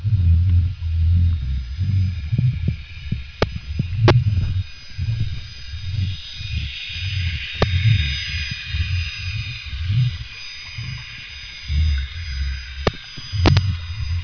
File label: boat, tivoli bay sounds; boat sounds and ui fish